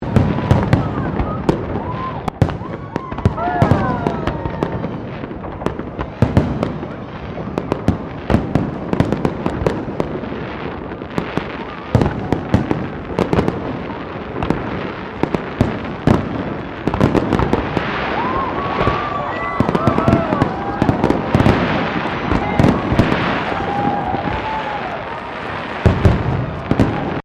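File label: Ambience New Year's Eve Melbourne Crowd Fireworks; New Year's Eve 2008 Fireworks; Firework Sound Effects; Foundation1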